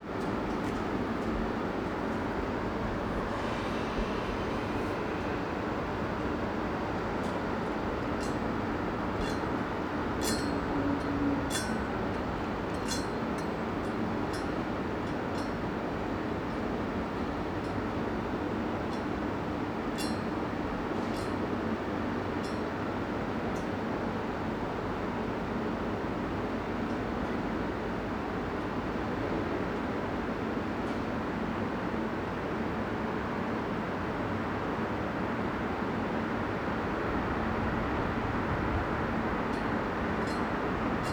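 Ambience, Industry, Industrial drone, Metal, Clinking; Hzandbits; Industrial Exteriors I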